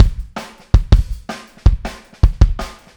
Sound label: Kick, 162 BPM; Verse; Drums